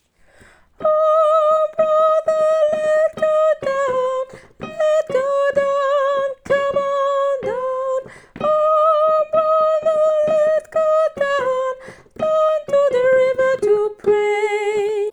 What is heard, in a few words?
tenor